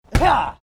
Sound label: karate kick punching bag